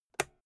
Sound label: old switch plastic robust